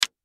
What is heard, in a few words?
Impact Wood Piece Thin Hit; Wood (Sound Effects:Materials); Blastwave